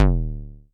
bass stabs; FutureRetro FR777

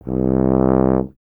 BRS TUBA, Solo Instruments

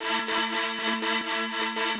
120BPM LOOPS; Bolder Sounds - Granular

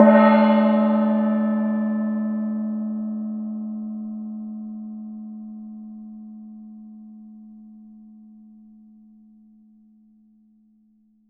Tibet Gong; Polyend; Simulacrum Sounds; 1 Shots; Acoustic and Electric; Drums

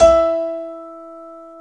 PNO, SY PIANO, House; Best Service ProSamples vol.10; AKAI

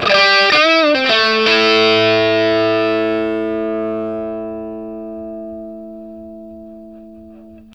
Vintage Blues Guitar, 60, A; USB Soundscan vol.22; AKAI

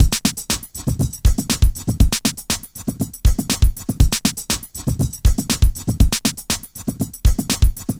Standard Beat; Sonic Foundry (Sony Creative Software); Myths of Technology; Composite Beats

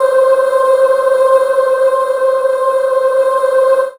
pad c, Chillout ambient Synth Pads

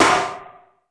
TEK.S, TECHNO

